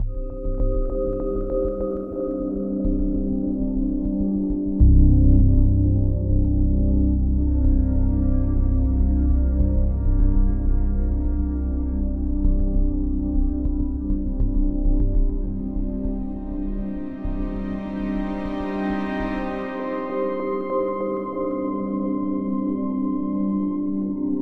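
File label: Synth Atmosphere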